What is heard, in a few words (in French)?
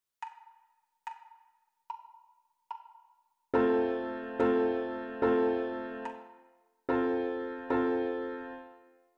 Rythme Piano Seul